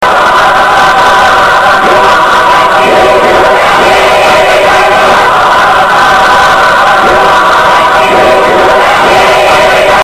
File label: crashed